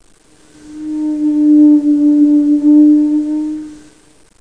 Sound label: Inside Whale; Education Publishing Concepts